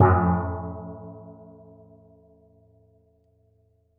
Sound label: Steel Drum